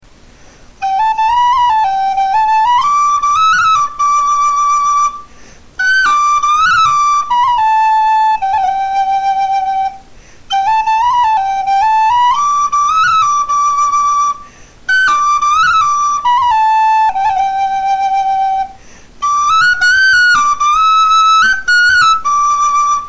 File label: yuri high sally gardens; whistles